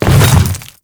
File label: Hammer Boss Step Ice, Footsteps; Warframe; Grineer Hammer Boss